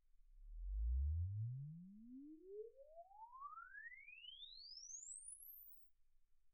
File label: Loudspeaker uncal sweep